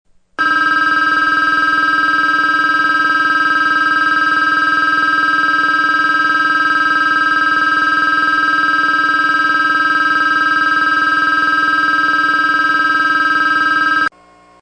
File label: shimada bell; ＪＲ九州の自動放送 ＜汎用・少数・分散型＞; ベル・サイレン